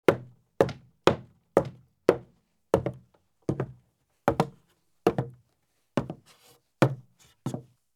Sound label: Heavy Boots Walk up Steps and Across Wood Porch; Sound Ideas; Footstep Sound Effects